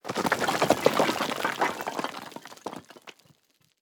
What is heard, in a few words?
impacts; World of Tanks